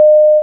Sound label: start